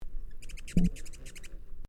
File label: lyrura scolding